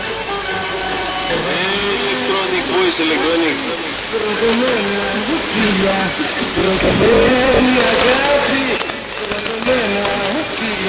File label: 1617 khz, pirate radio